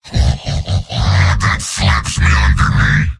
heavy laugh long; mvm

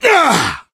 melee swing, player survivor voice

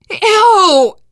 reaction disgusted, teen girl, survivor voice; source engine; left 4 dead 2

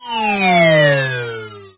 space close program; windows 9x; sound schemes

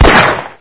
pl gun, weapons; source engine; hl1

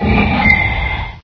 ichy pain; Source Engine; bshift

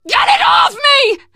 scream while pounced, teen girl, survivor voice; source engine; left 4 dead 2

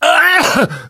choke; source engine; left 4 dead 2; namvet

